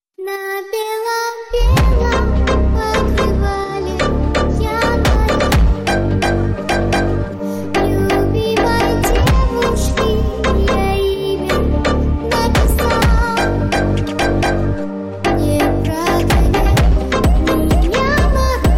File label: phonk remix